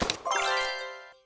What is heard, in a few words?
gun shot bubble burst right answer